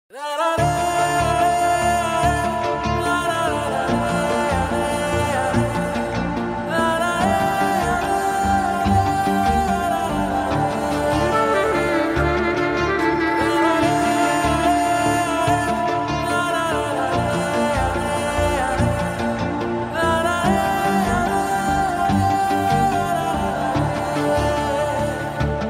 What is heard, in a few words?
Pakistani drama OST tone ringtone